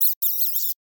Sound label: insect chirp vocalization; sound effects one